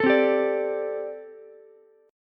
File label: A Minor 11th A Ukulele C6, Chord; Am11 strummed; JGuitar